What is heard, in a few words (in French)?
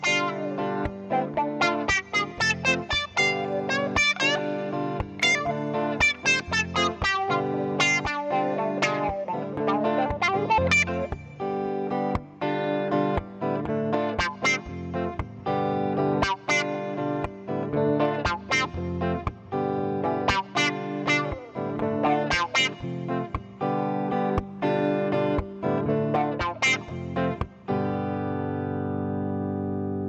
Guitare Wah